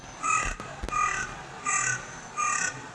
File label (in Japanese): hasibosogarasu; zukan